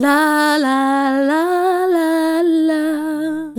Adlib, Hip-Hop and RnB Vocals

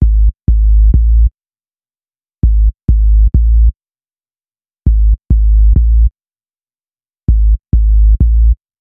Bass, Hip-Hop; Sonic Foundry (Sony Creative Software); Lukecage Underground Soundlab Hip-Hop

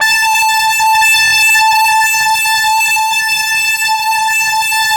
FLYER A4 L, Yamaha VP-1; Music Store; 90's Sample CDs

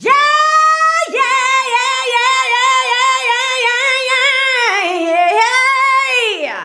"YEAHYEAH", FEMALES; Best Service; Hyper Dance

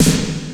GATED S909, GATE COLLECT; SPECL FX

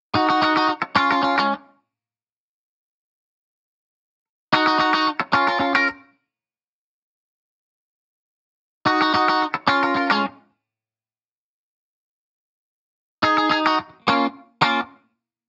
Guitar Bpm110 Key D# minor, 70s Guitars; Fox Samples; Back to the 70s Guitars

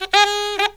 SAX; USB Soundscan vol.29; Killer Brass Riffs; AKAI

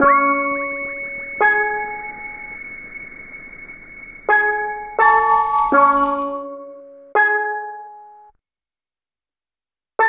Melody, Hip-Hop

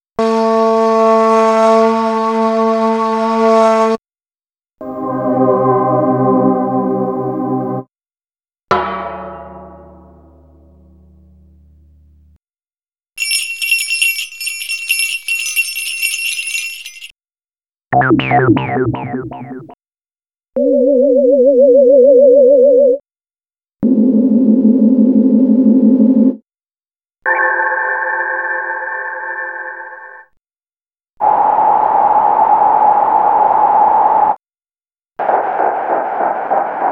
Ambient; Demo Samples from Ambient; Time + Space - What's Next!

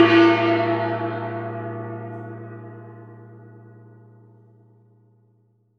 chinese gong; AKAI S6000 CD-ROM - Volume 5

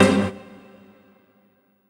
HOUSE, House Dance; 90 s Sample CDs; Best Service; Dance Mega House Dance

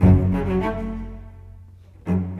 Bass Cello

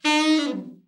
ALT FALL, ALT-SAX FALL; Giga Samples Collection

